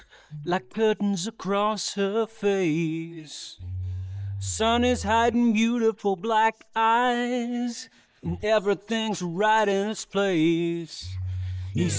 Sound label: vocal; sgmse